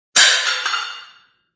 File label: Metal jar lid clangs onto floor.; Clanks; Sound Effects:Objects